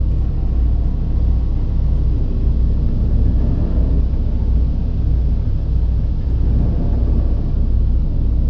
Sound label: drone steam